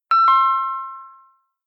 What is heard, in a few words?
bell ring